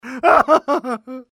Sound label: cry; Spy; taunt